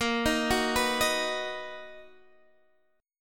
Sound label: B Major A sharp; B/A#; arpeggiated